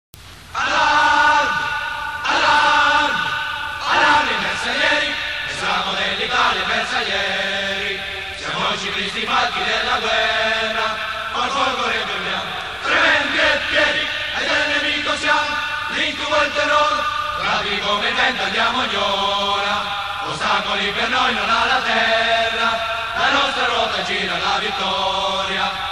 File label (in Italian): allarmi inno bersaglieri ciclisti cantata